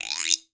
bamboo, pop rock set

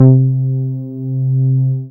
BAS MINI, Mini-Moog; Sound & Vision - Gigapack II CD 1

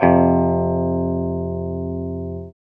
guitar 5TH

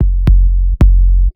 BASS, Houseworx; Ueberschall; Workz-8

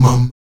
VOX MUM B 2E, Afro Chants; Roland LCDP11 Africa VOL-1; Stacc Mums

